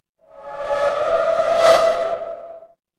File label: transition whoosh